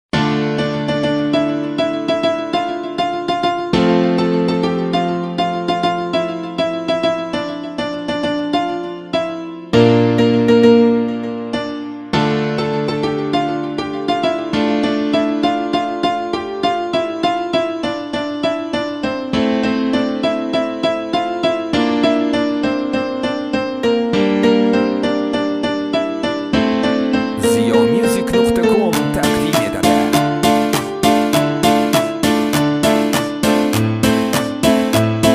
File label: zamanlar piano; uzbekskaya pesnya